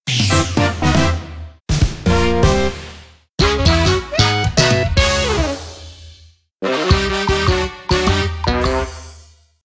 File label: Bowling Jingle; yakuza 0 b sides ps3 ps4 windows xbox one gamerip 2015